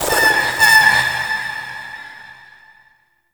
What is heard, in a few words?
Flare Cast; Warframe; Sentients; Sentient Trooper